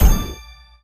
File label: Striped Created; Candy Crush Soda